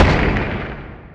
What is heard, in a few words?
asteroid damage carbonic big; Factorio